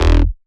Bass Shot E

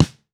Snare Lo-Fi

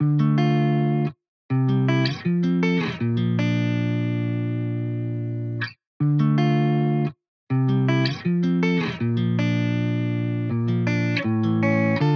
80 BPM D Min, Guitar; Cymatics; Miami Guitar Pack - Pt 1

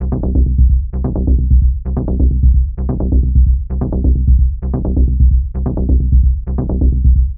Bass Loop 130 C, Techno; Ghosthack; Free Techno Sounds 2024

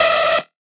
synth screech oneshot E dry; Synth Hits; GhostHack

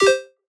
Retro FX; Cymatics; Video Game Sample Pack Vol 1

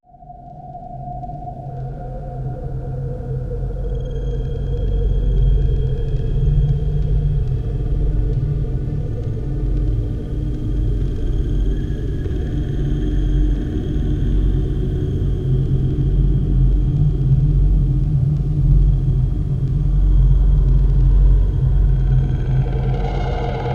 Dark Drone - Am 60Bpm; Construction Kits; STEMS; Ghosthack; SkyRealm; Kit 28 - Long Walk Home

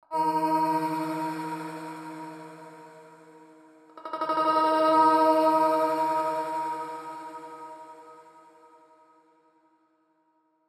110bpm E Grain, Vocalpack; Vocals

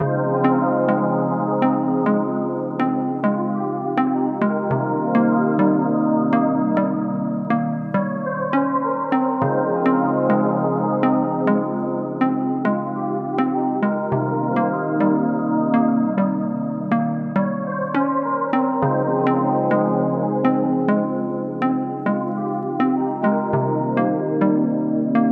102 BPM F Min, Melodies; Cymatics; 2022 Melody Mystery Expansion

